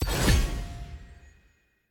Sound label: radial menu role select; parties